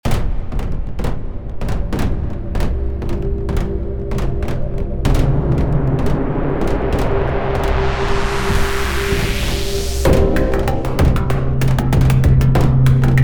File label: cinema